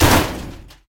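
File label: knock of metal car scrap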